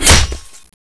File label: knife hit wall; ninjs